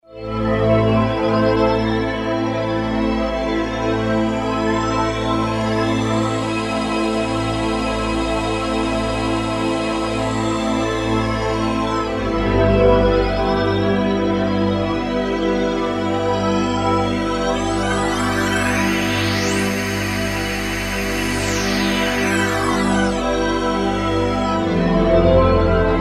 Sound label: Morph Z Synth, E-mu Morpheus; Factory Presets